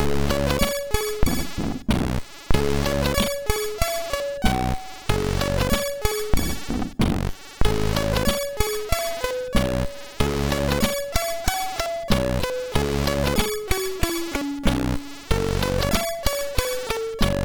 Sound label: sid MOS6581R3, GAMES; Bruce Lee II